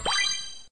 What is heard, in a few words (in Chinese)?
获得物品弹窗